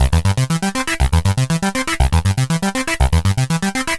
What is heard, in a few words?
Arpeggio Bass, Prototechno